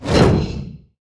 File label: heroes bonehart basic attack cast